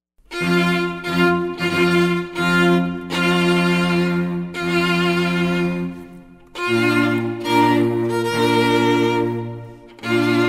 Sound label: Bridal Chorus, Strings Trio